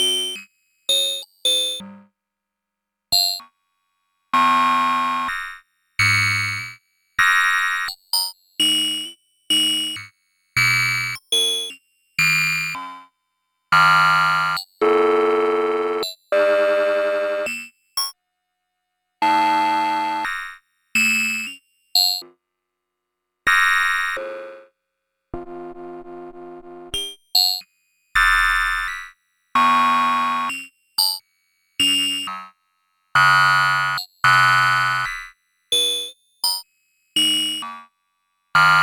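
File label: serge ring modulator phaser; cgs-serge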